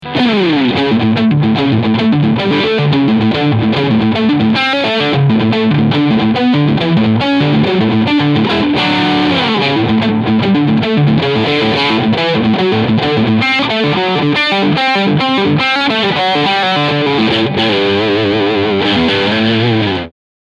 Overdrive Steg PU

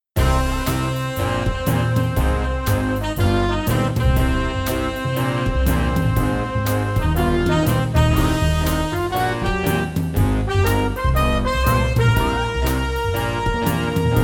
Schlussgroove

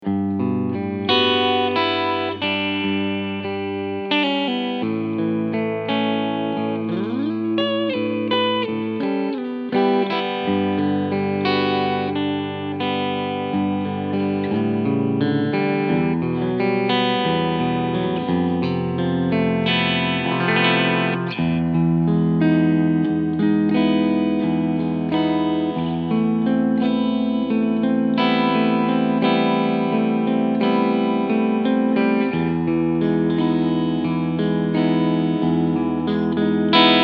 Harley Benton TE 20MN E-Gitarre Cleansound beide Pickups

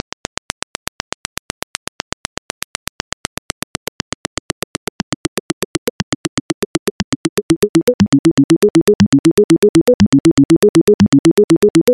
Softube Model 80 Sequenzer Envelope

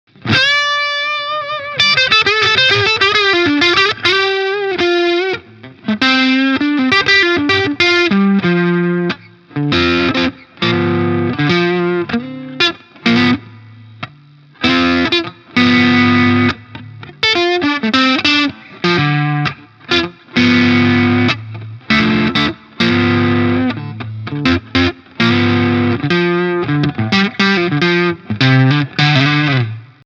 Fender Squier Bullet Strat Hals verzerrt

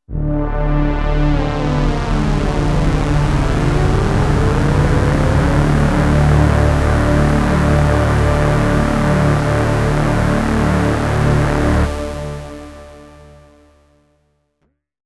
fett bass flaeche

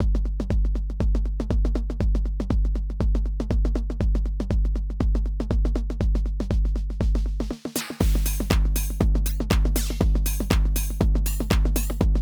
SSL UltraViolet Drum Machine mit EQ; WAV

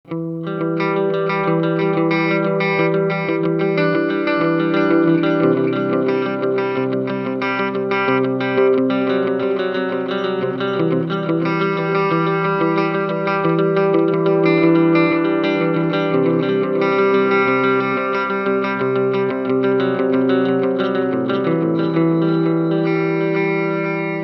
Boss RE-202 Wow u. Flutter 10 Uhr

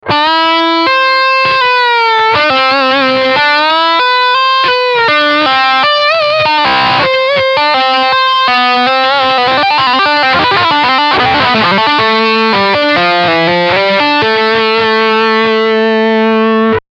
Leadsound Steg HB

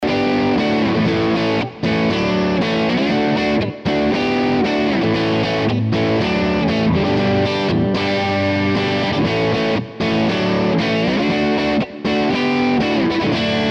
Les Paul 60s Beide Pickups Crunch und Sustain

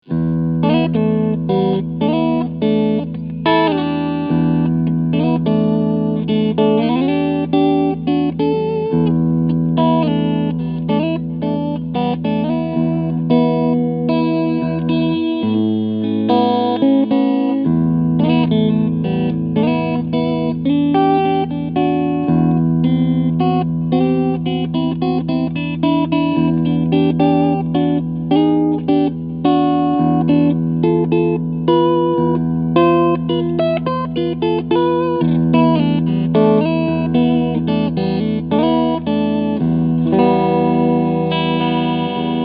Jackson JS32Q DKA Dinky E Gitarre Clean Sound beide Pickups

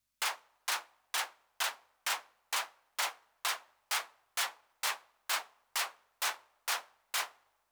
Clap ohne dann mit AC

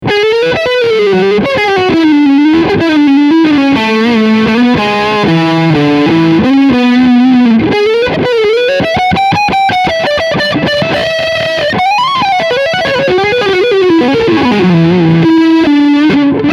Leadsound Front HB

